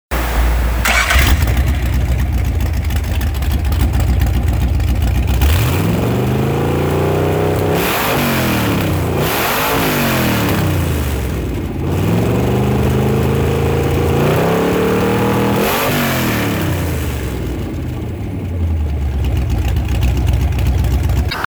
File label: 302 Ford start up rev sounds great